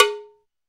cowbell lo; Drums; Ableton Foundation FreePacks

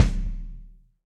Kick Felt Low Reso Classic; Drum Hits; Ableton; Factory Packs; Session Drums Club; Standard Hits

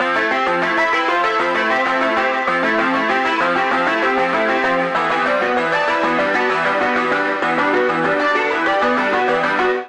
Bounce C Major 97 bpm; Accompaniment; Tonal; MIDI Clips; Sapphire; Drive and Glow; Ableton; Factory Packs

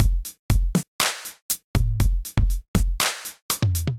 Disco Arcade Kit, Drums, Hybrid; Ableton; Factory Packs; Drum Essentials

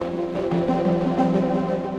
Warm Dream Harp; Instruments; Distressed Strings; Ableton; Mood Reel

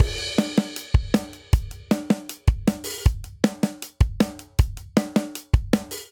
Twist 06 Verse Variant 157 bpm, drum; Ableton; Factory Packs; Drum Booth

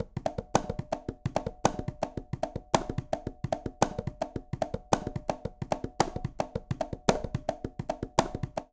Bembe Conga-110bpm; Latin Percussion; Ableton; Factory Packs

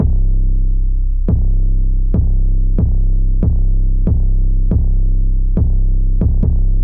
808 Bass C 70 bpm; Trap Drums; Seclude; Trap Drums by Sound Oracle; Ableton; Factory Packs

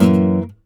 F# Dom7 Stac; Ableton Foundation FreePacks; Wicked Kits

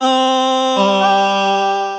Chromatic Voice; Chromatic Voices; Basic Voices; Ableton; Factory Packs; Voice Box

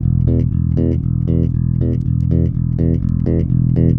110 Pop Basic Bass E, E-Bass; Pop Rock

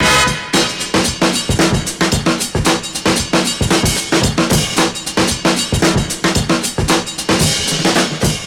Drums, breakbeats; Head Spins; Ableton; Breakbeats by KutMasta Kurt

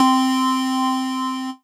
Synth Keys

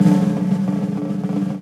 SNARE stick press roll

